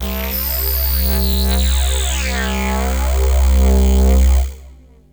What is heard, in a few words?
Korg Prophecy hits, arps and fx; FM 57 - The Ultimate Sample Collection (1997)